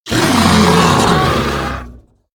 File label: Evasive Chimaera Death